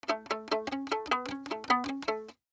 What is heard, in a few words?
Violin Pluck Play Ditty; String; Blastwave; Sound Effects:Musical